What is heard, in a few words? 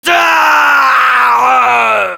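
pain critical death, fr; Heavy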